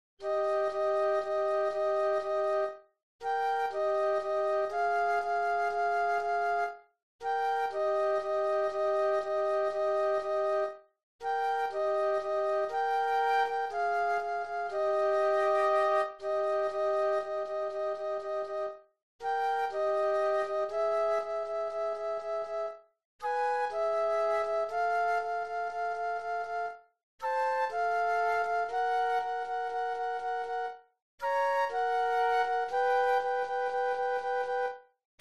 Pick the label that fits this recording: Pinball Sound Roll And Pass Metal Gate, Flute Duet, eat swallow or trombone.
Flute Duet